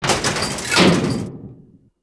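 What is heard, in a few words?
Kart Attack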